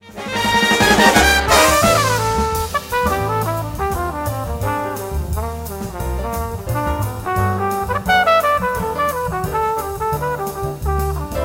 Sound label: Solo on Big Sky